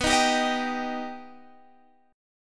B Augmented B, chord; strummed; 19,18,17,20,20,x

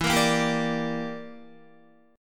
E Minor 7th Flat 5th E, Guitar; strummed

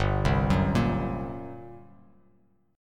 G Minor Major 7th Double Flat 5th, G; arpeggiated; JGuitar

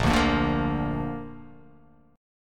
Bb Major Bb down, chord; strummed; JGuitar

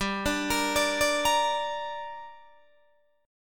G Minor G, Guitar, DADGAD

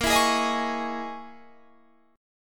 Db Minor 6th Add 9th Bb

